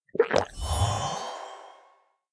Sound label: use heal potion